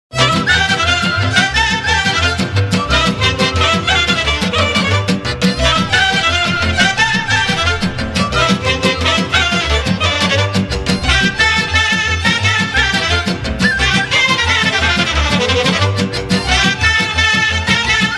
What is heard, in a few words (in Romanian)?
Iedera orchestra